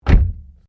CAR SUBARU LIBERTY INTERIOR DOOR CLOSE; Family members Subaru; Subaru Liberty 2001

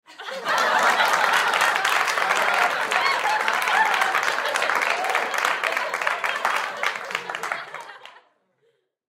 Human Crowd Studio; Crowd Reactions Laughter Clap Loud Fade Out

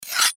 Knife Sharpen Blade Single Stroke Scrape Metal; Knives; Warfare; Blastwave

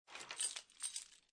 Keys Pick Up Off Wood Table

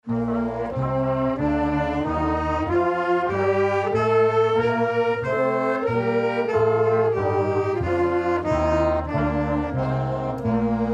Music Class Band Scale Exercise, Orchestral; Musical; Big Room Sound

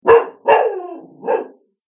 Dog Angry Barking; Dog Sound Effects; animal sound effects

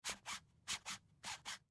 Lint Roller Roll Multiple; Rolling; Foley

